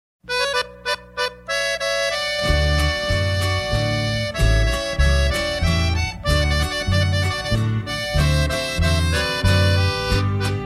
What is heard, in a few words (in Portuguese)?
sertanejo classico